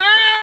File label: ah, Voice ooh ahh